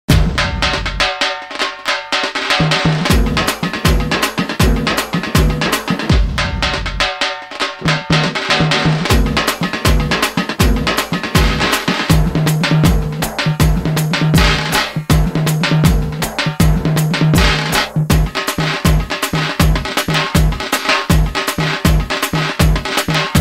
tamil kuthu song ringtone instrumental music ringtone; jithan